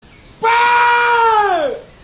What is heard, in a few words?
baaaa